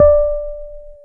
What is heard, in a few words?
Pianet; Keyboards of The 60's and 70's; H.Pianet